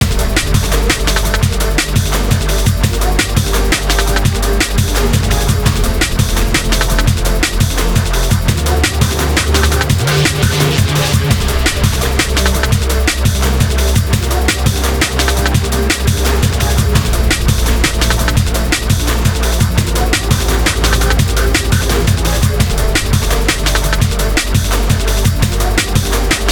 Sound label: Industrial Break; Industrial Music Pack